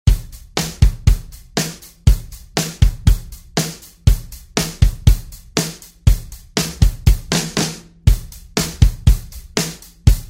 Bus Exciter Full Drum Exciter

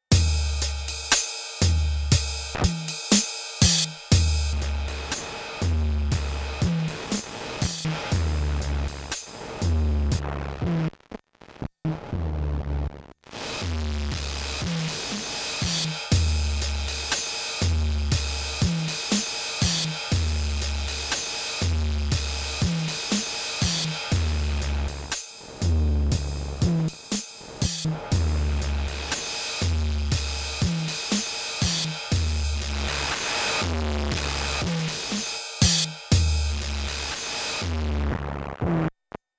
NTS-3 Drum Fuzz

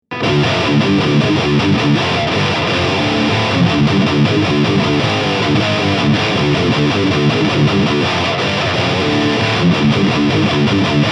ESP E-II RZK-i Burnt FL high gain

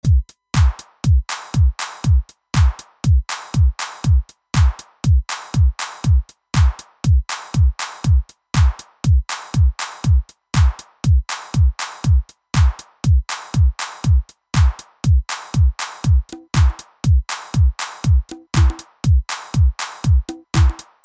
Neve Line In EQ Drum

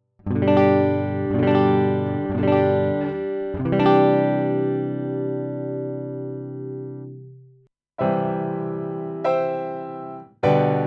UA Apollo 610A

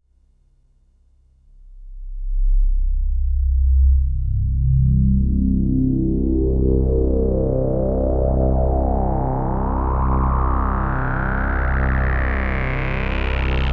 Filter Sweep Voyager

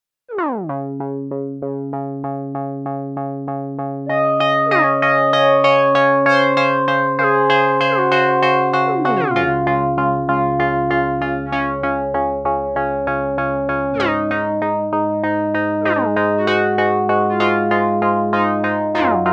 ping pong delay

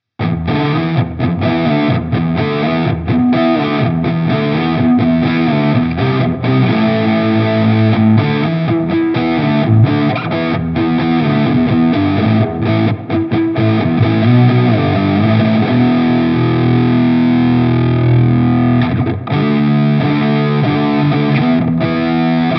NUX MG-101 Hazey Fuzz

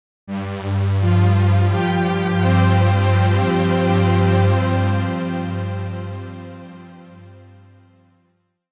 Long String; Cherry Audio GX-80

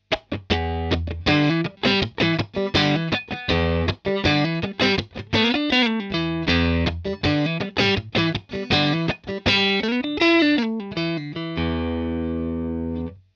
Boost Neck PU

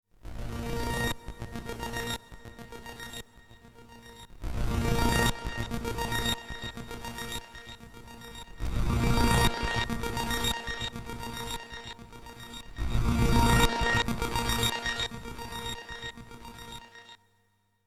Soma Pulsar 23 Pitch Reverb

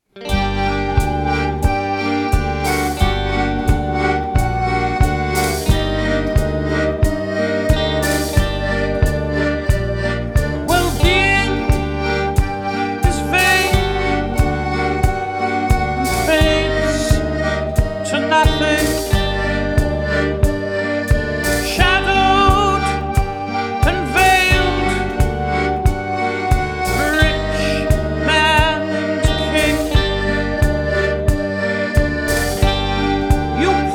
DRY; Nothing lasts

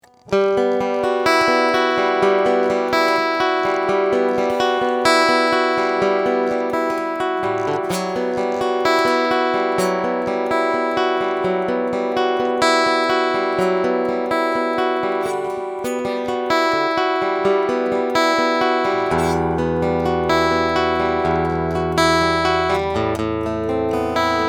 Takamine FT341 Picking Line buzzy

